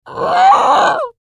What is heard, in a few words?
Monster Dinosaur Bird Groan Moan Wounded; Beast Creature; Animal